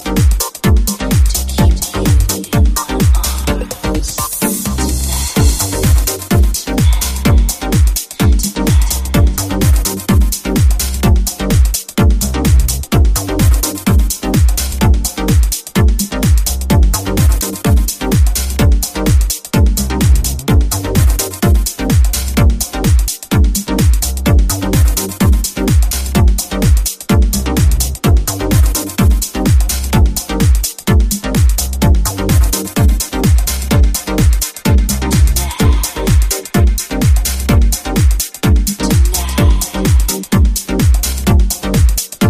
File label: Dub Mix